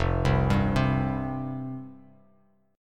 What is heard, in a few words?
F Suspended 2nd F Bass Standard; Fsus2 arpeggiated; JGuitar